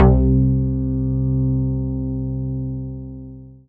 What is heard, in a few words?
BASS MONO